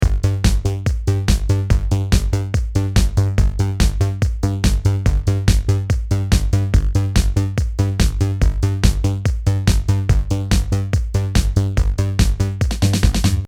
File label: Drum Bass